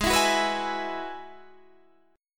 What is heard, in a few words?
G sharp Minor 7th Sharp 5th G sharp down Guitar Standard; G#m7#5 strummed; JGuitar